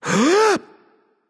nv breath, vox; smite; voicepack; vulcan

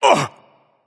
nv surprised, vox; smite; voicepack; vulcan

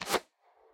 minion bow shoot; smite; npc; roman archer

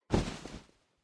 cloth; smite; chaac

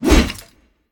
auto swing shield; smite; Ares

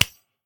clap; smite; god tiamat

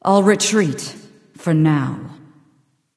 health low; smite; voicepack; nike

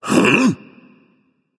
surprised, voice; Smite; ymir skin14

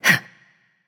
grunt jump; smite; nox

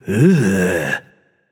nv tongue; smite; voicepack; hachiman

